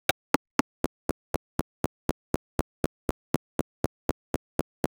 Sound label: futuristic short click; synthesizer